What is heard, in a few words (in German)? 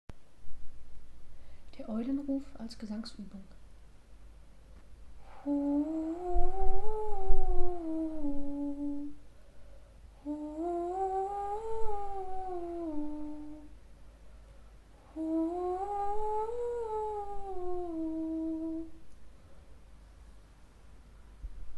Kopfstimme Gesangsuebung Eulenruf; Kopfstimme finden